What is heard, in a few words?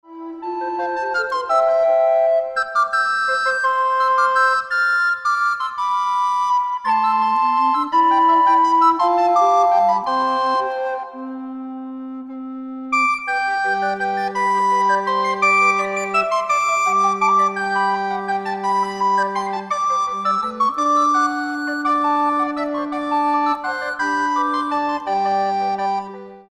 Recorder Ensemble